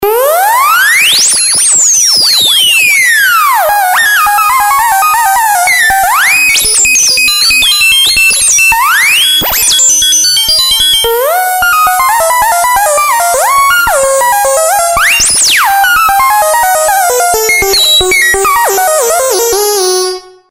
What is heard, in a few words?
Plan-B Model 25